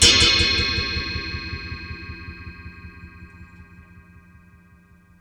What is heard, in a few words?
Delayed, Wire & Tin, contact mic; musicradar; contact mic madness